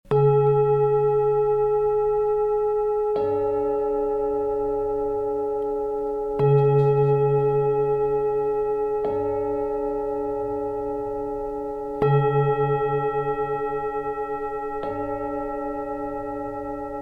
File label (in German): Klangschalenmassage